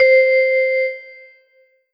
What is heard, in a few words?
Korg 01W Vibraphone C5